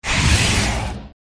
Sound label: Fire Spirit Dodge